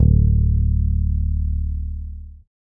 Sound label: Single Bass note